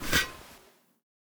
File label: 2 handed cleaver deploy; Rust